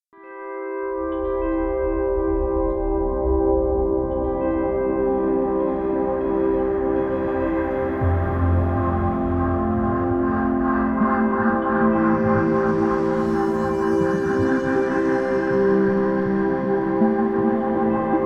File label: Cosmos Documentary Ambient